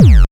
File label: kick; foxdot default